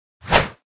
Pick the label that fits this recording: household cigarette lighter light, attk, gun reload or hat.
attk